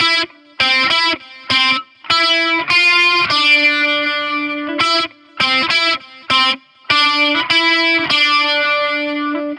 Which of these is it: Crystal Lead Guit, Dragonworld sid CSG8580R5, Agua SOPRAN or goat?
Crystal Lead Guit